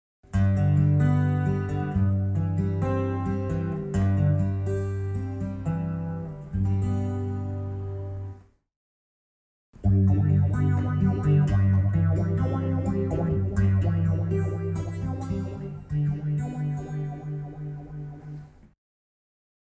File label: Folk Acustico Effects, chitarra